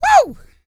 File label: YELL, MALE HUMAN; Spectrasonics Vocal Planet